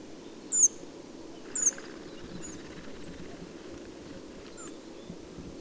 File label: hawfin coccothraustes; chuluut gol